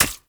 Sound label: Fey Flock Attack Hit; Powers